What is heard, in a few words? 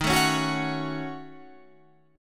D sharp Minor 7th Flat 5th